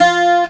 CG HARD F3, Celtic Flavours, GTRHARD; USB Soundscan vol.42; AKAI

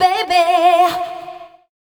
Dance Vocals, FEMALE

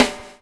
BUBBLE; Spectrasonics - Backbeat

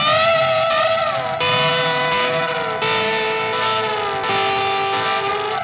Static FX Guitar

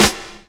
drumbreak, 130; Ueberschall; Techno Trance Essentials

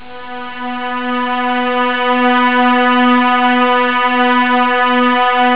cresc string; jazz jackrabbit 2; M8

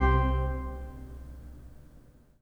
PIPE, 3DOrgans

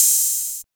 808 BRT OHH, SYN HATS

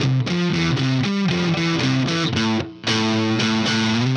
Melody Riff Guitar, Indie Rock

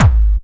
kick techno, drums; Techno Trance Essentials

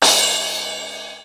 Yamaha RX-5, drums perc; Rubber Chicken Software Sample Bank

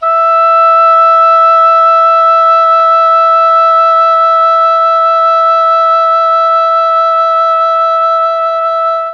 E3 OBOE, Mellotron; CHMBLN OBOE; Mike Pinder - Mellotron Archive CD

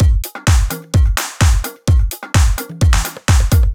Drumloops Beat 128 BPM Fill; Multi Drum Loop 33

